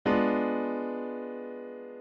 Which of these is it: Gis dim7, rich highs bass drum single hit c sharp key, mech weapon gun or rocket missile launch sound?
Gis dim7